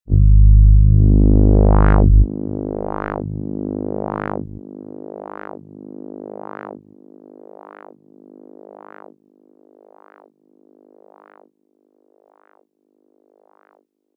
pro-one filter sweep

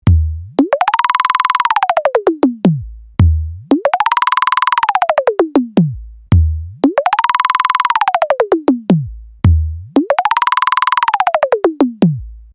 flying 808s

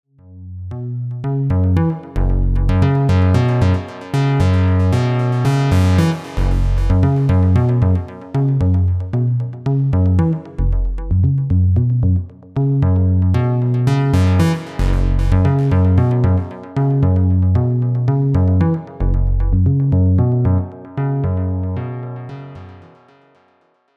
Nice Low Frequency Phrase; GMS

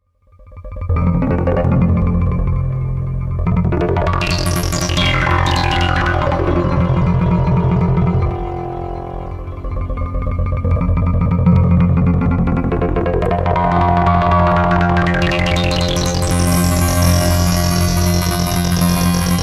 Roland D50 Automation

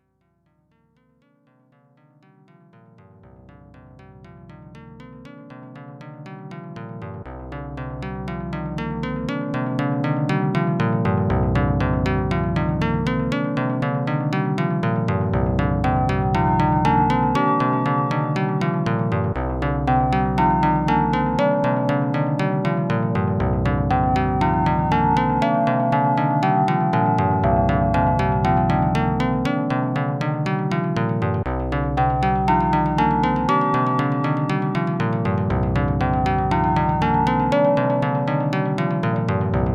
Out of Tune

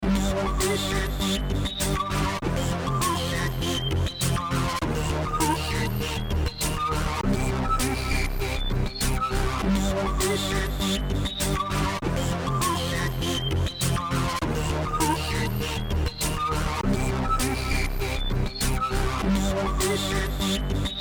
warped ms2k noise

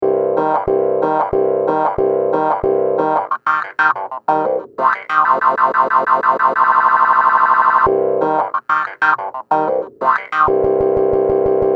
robot clavinet

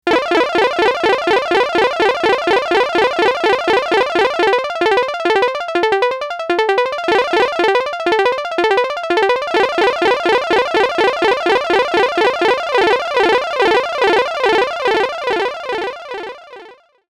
Classic Video Game Like Sound

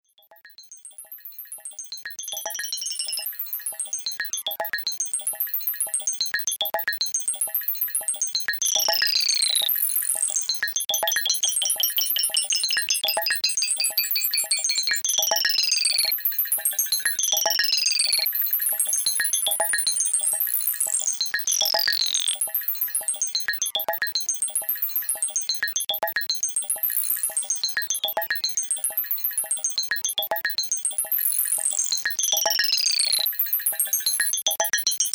impossibly high rhodes sample

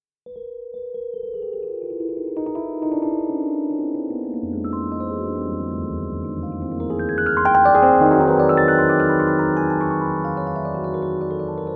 gestural music interface

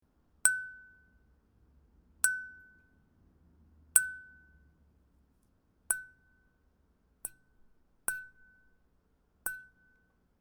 giant light bulb tone

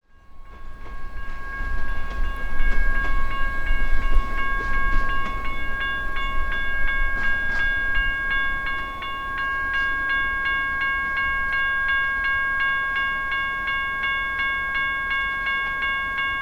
train track bells

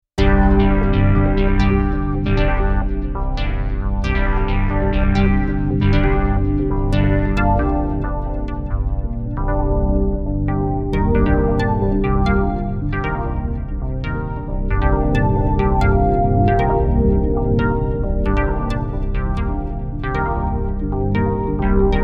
Arpeggiated Poly Subs

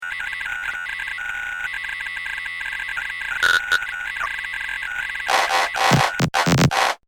modem like glitch

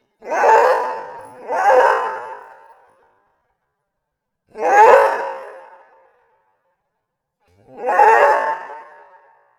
time expanded dog bark

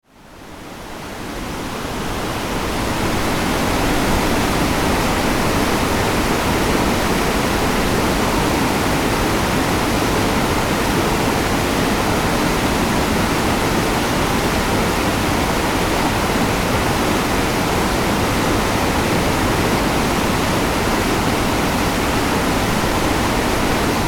more damn noise

